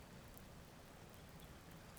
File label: pulses, BALAENOPTERA; MONACO 2022-07